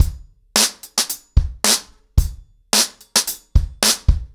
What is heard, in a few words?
110BPM, ACOUSTIC DRUMS; The Roots Drumloops